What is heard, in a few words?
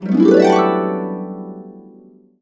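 D major, Harps; USB Soundscan vol.62; AKAI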